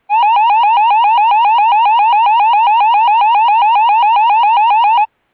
Euro Fire Sweep Tone 900-800Hz

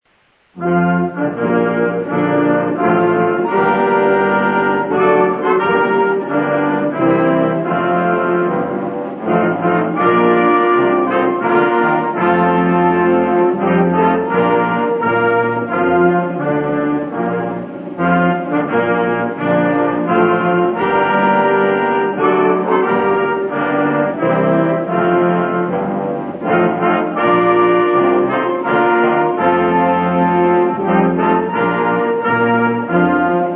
National Anthem

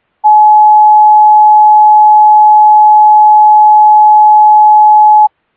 Steady

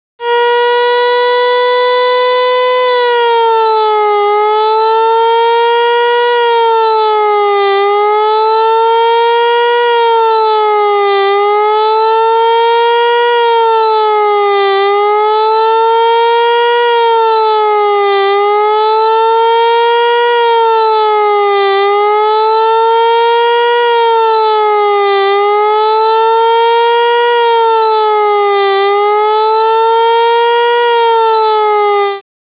Fast Wail; Eclipse

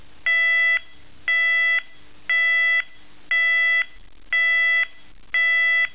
Intermittent 660 Hz at 1 Hz (500ms-500ms)

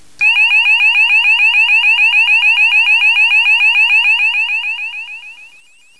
Tone Sweeping 800-970 Hz at 7 Hz

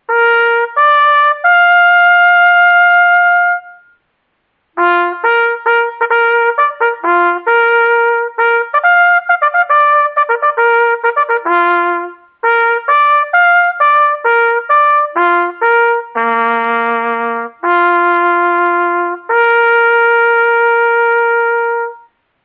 Bugle Call Call To Quarters

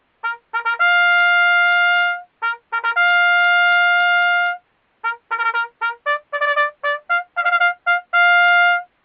Bugle Call Fix Bayonets

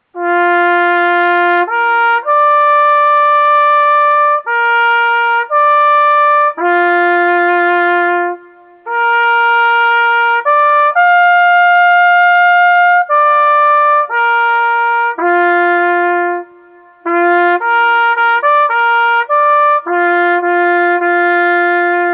Bugle Call Church Call

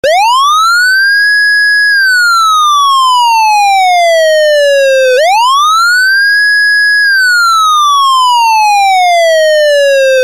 Siren Tone WAIL; UNITROL; Pathfinder

